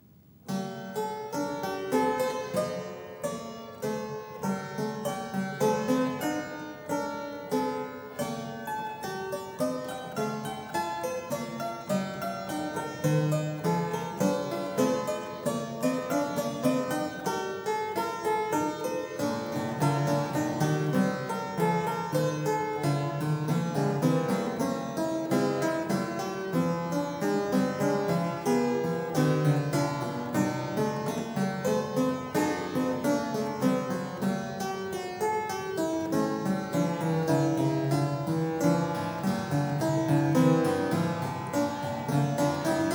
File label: Prelude G Sharp Minor; Prelude No. 18; BWV863; Bach - WTC - Tilney